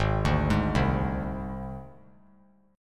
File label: G sharp Major Flat 5th; arpeggiated; 3C,3F,3A,3D; 8,9,11,10